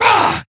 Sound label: wound crit, creature; Shadron